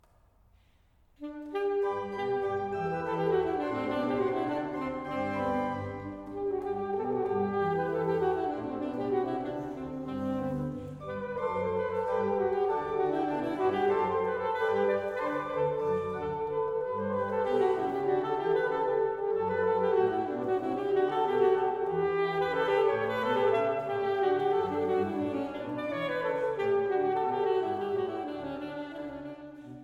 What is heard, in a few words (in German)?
Sax Orgel; saxophon; Friedenskirche KS; J.S. Bach BWV 1035; 2. Allegro; Friedenskirche KS 08.09.2023